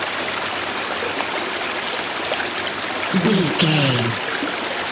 river stream flowing water loop

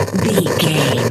radio tv electronic static

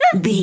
voice fun small character emote scared

